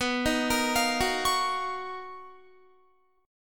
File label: B-Major Sharp 11th B down Guitar; BM#11; arpeggiated; 4F,4A,5C,5G,5C,6E